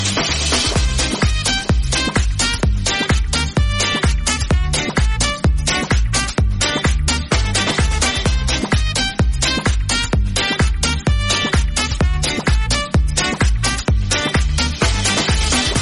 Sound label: carnaval ringtone